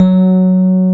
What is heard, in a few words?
piano Rhodes